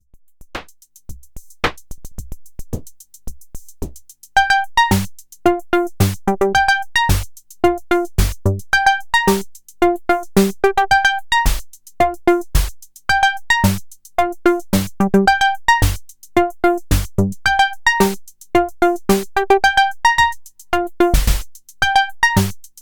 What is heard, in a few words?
Novation Circuit Mono Station Pattern Mutate